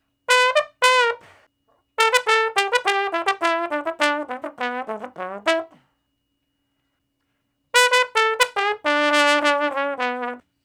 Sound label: Trombone Slo Blus (E IV, IV, I, I); Solos